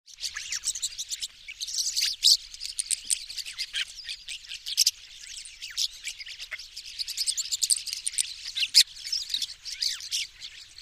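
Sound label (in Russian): skvorec, starling; zvuki ptits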